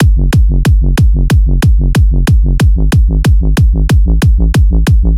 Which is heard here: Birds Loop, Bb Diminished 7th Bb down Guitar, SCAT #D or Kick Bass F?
Kick Bass F